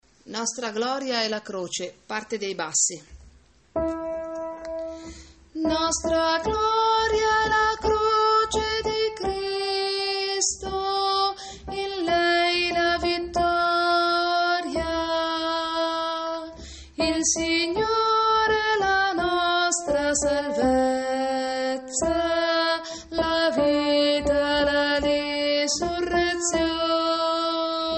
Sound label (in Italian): nostra Gloria La Croce Bassi